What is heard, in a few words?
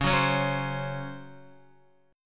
Db Major 7th Suspended 4th, Guitar; strummed; JGuitar